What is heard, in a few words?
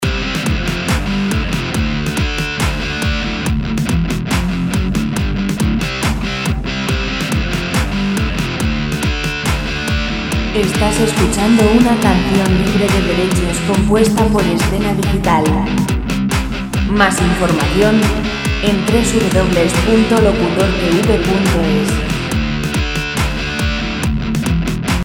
140 rock; rock musica libre